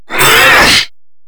Exertion; Enemies; Vocals; Warframe; Grineer Hammer Boss

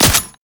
Alt Fire Mech; Weapons; Warframe; Tenno; Oraxia Flechette

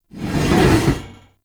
Jump Slam Foley; Warframe; Veilbreaker; Sprag

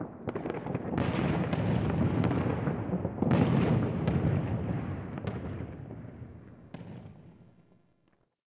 Ambient Distant Explosions; Nineteen Ninety Nine

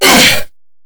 Grineer Hammer Boss Pain C; Warframe

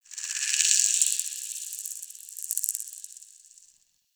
clicky cave critter; Warframe; Tau

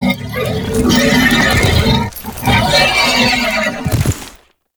Horse Idle Seven; Warframe; Duviri Horse; Vehicles

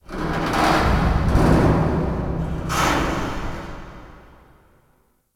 Warehouse Metal Random, ambience; Warframe; Guild

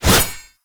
Bayonet Melee Whoosh Fast; Warframe; Tenno

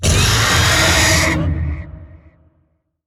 Vocal Pain; Warframe; Zariman; Void Angel

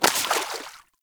Short Sword Medium Impact Water

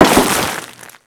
Crewman Land Ice, footsteps; Enemies; Warframe; Corpus

